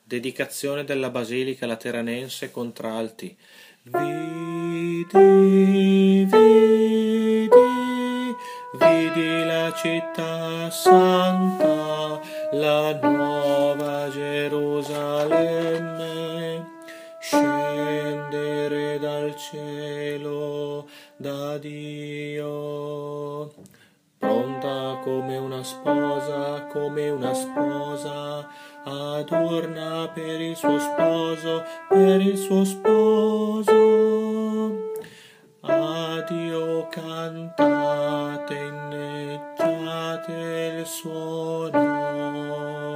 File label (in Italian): Contralti; Dedicazione basilica